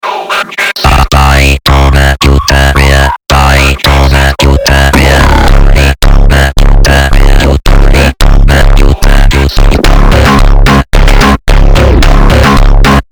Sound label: Phonk